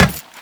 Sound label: run metal, footsteps; Warframe; Teshin